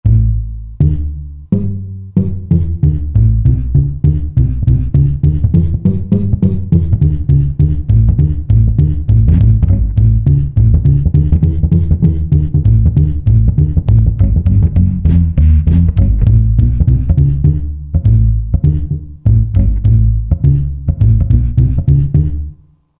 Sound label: Washtub Bass; Country; Roland SR-JV80-17 Country Collection